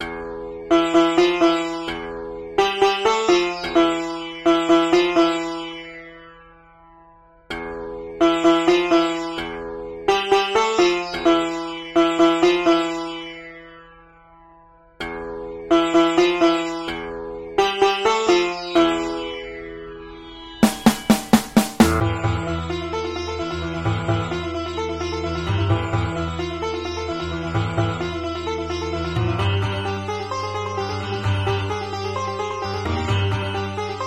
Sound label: sitar model binaural